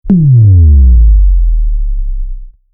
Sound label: Synth Sine Wave Bass Drop Long Sub Bass Impact Deep; Sound Design; Descending Sound Effects